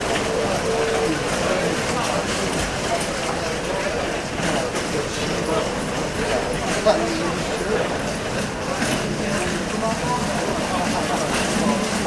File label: ambience airport heathrow arrivals hall; sound effects one